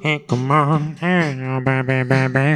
VOX, Roots Disco; USB Soundscan vol.32; AKAI

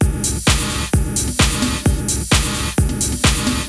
Beat (130BPM), Gated Reverb; musicradar

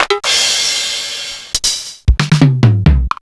MXR 185

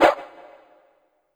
DRM SHK Shaker; Polyend; Plughugger

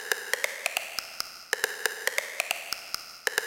up down, techno 138 bpm; Best Service ProSamples vol.54; AKAI; HI ENERGY

